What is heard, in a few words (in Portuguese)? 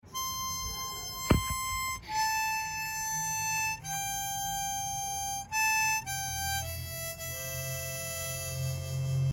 gaita triste